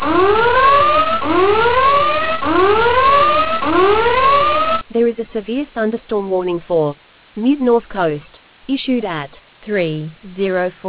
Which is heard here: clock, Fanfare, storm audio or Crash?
storm audio